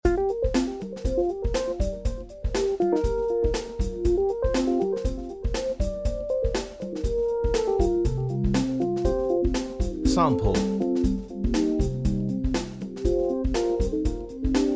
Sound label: beat